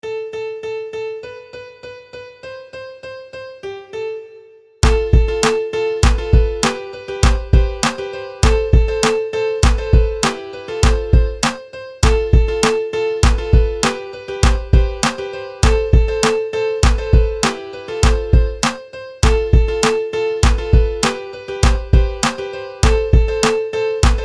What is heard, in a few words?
piano mystery